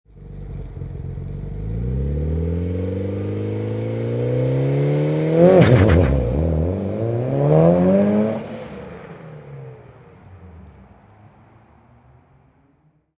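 Mitsubishi Evolution Exhaust Takeoff, Auto, Power Car, High Performance Car Sound; Exhaust perspective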